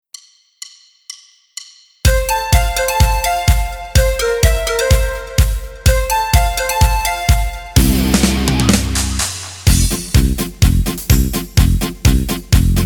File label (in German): GCFB ohne Harmonika mit Chor